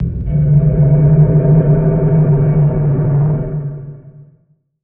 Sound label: Texture - C; 99Sounds; Monosounds; Music Loops; Instrument Oneshots